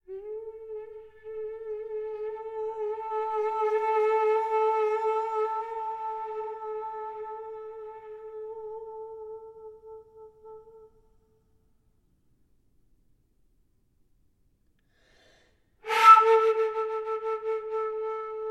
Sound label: kyrie mantra duo for flute and prepared piano